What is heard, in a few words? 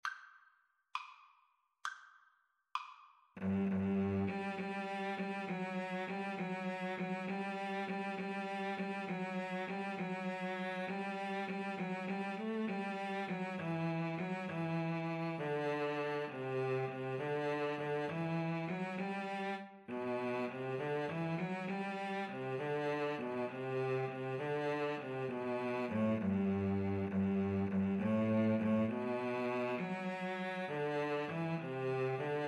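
good christian men rejoice Viola Cello; Viola-Cello Duet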